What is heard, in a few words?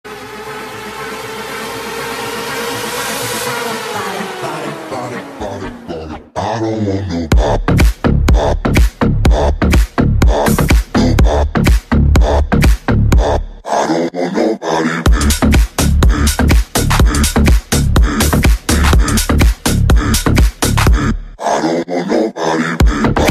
Remix shuffle